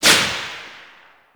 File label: SMG Tail Indoor; Warframe; Tenno